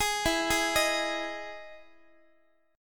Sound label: E Major 7th E down Guitar; x,x,11,14,12,14; 4A,4E,5A,5D,5G#,6C#; arpeggiated